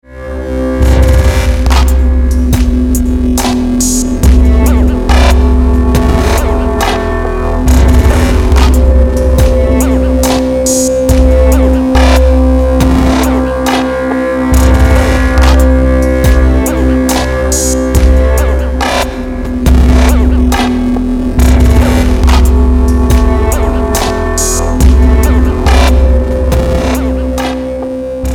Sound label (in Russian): Глитч-хоп на звонок